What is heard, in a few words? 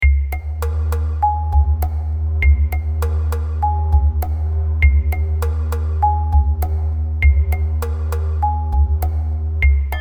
caverns, RPG; The Frontier - RPG Set